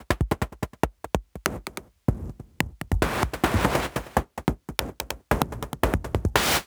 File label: 144 Noise Perc